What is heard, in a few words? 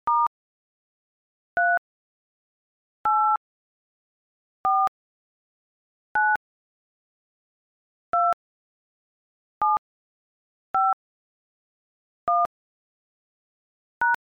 DTMF